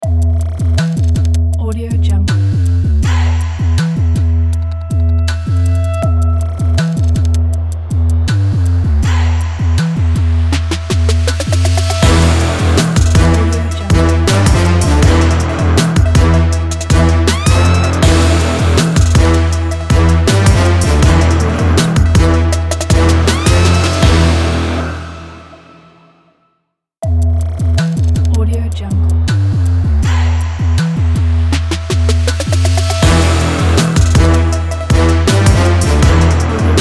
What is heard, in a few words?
extreme trap intro set logo